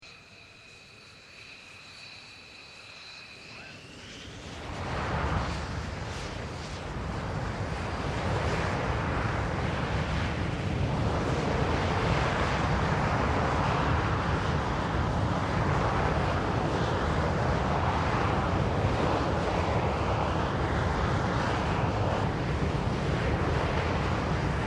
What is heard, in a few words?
AIROPLANE JETFIGHTER IDLE LAUNCH FLYOFF; Airplanes; Vehicles; Avalon Airshow 2005